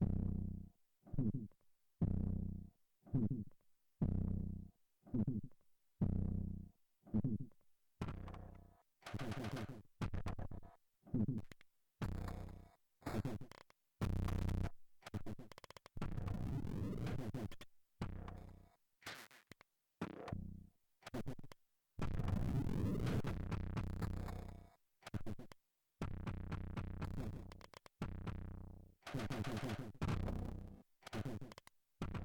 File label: noise drum; weeklybeats-2018